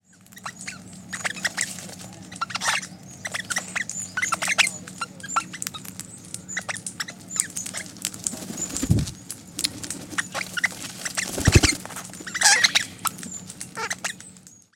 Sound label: crimson rosellas australia